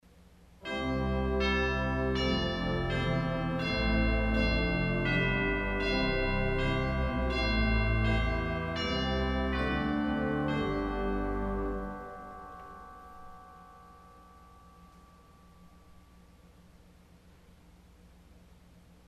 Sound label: Chimes St Anne Solo, organ stop; CulverAcademy